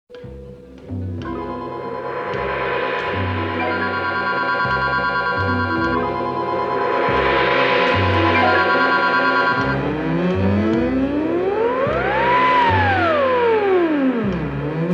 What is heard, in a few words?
dramatic horror